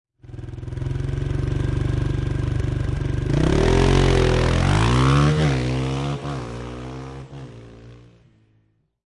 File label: Motorcycle Dirt Bike Drive Away, Motorcycle motor; Motorbike type offroad Dirt Bike, motocross, drive away; Motorcycle Sound Effects